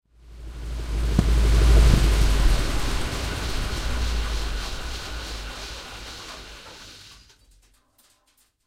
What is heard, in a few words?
sherman m4a1 engine int stop, tanks; War Thunder